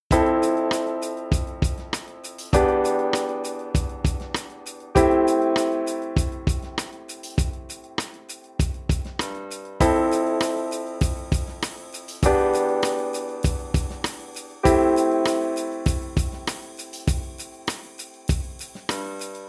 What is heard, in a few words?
Fmaj7 em am